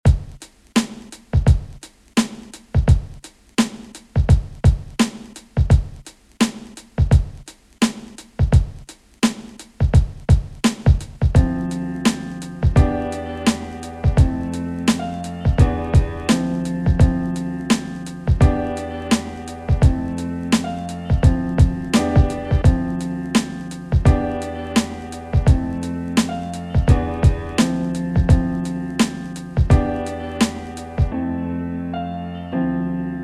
fragmentos instrumental

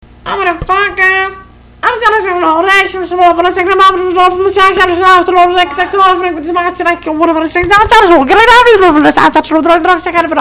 vinheta; banana doidera

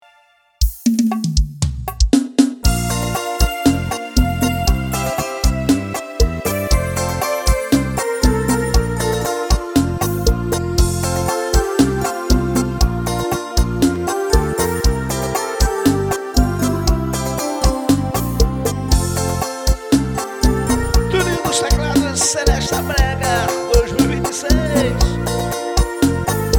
Saudade Serestão Brega ao vivo

